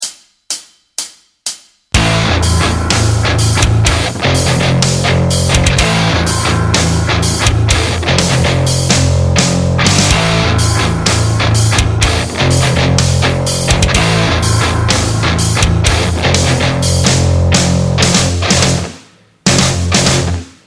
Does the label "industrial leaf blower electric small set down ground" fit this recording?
No